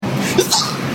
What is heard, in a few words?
mouse